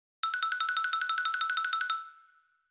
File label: cartoon running on air